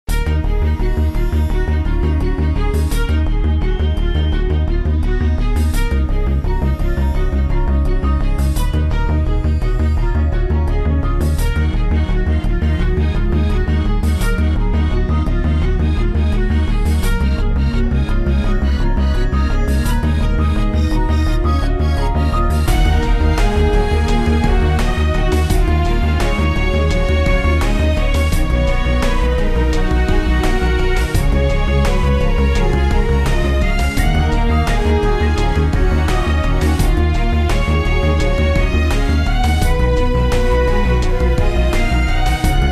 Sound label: run